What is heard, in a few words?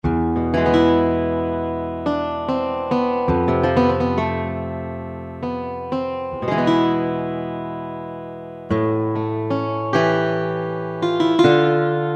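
Classical Guitar, Kurzweil K2000; Kurzweil K2000 V3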